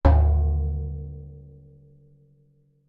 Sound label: impact metal tray large object strike resonate